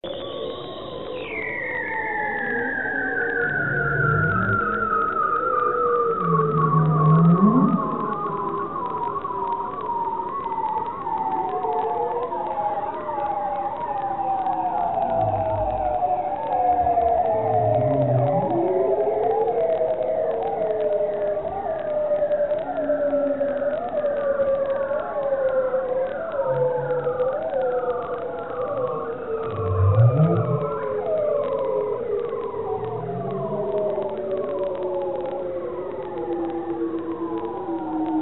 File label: bearded seal